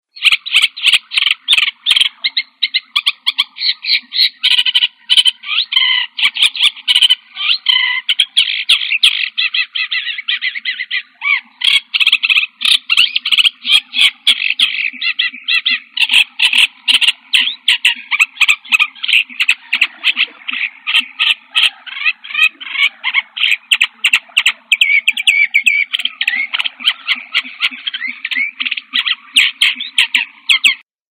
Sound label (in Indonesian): burung hutan